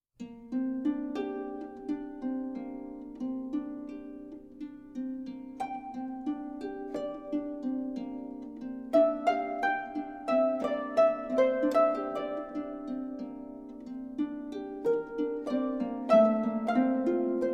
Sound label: Reverie, Harp; classical-harp